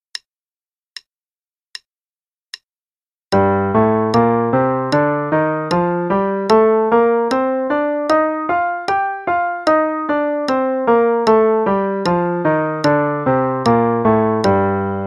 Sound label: g natural minor Scale only (qn=76); 8th notes = 2 octaves; CM Level 4; Daily Warm-ups